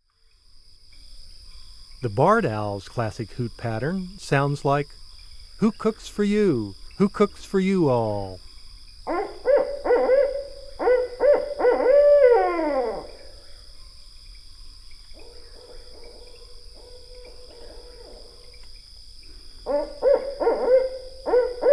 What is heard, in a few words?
Barred Owl, Bird Sounds; North America; Common Western Species; Know Your Bird Sounds